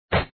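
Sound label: Zeppelin Hit; btd4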